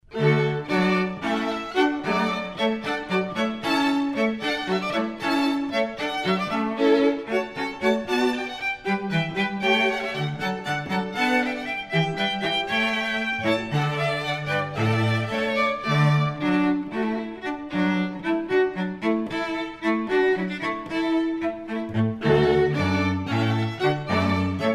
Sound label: Hornpipe, chamber music quartet; Handel